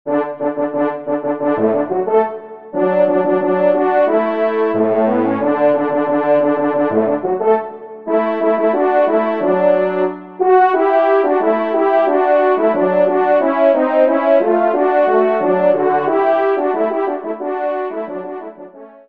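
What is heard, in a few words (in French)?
duo, ensemble, trompe cors; Kling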